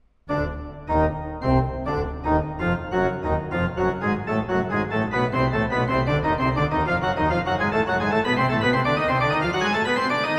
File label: dramatic organ epic